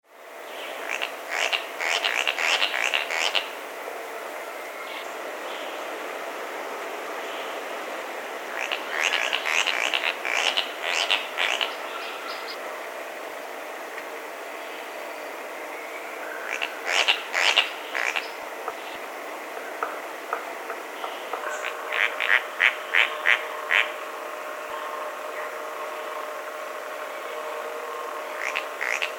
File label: Tityra cayana